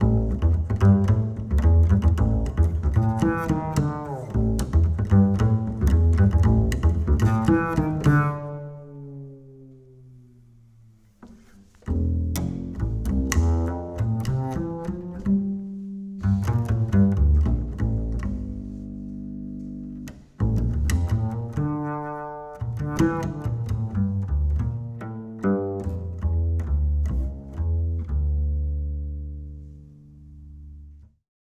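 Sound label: acoustic bass Plucked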